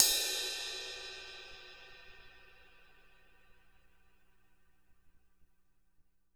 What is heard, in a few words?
crash tip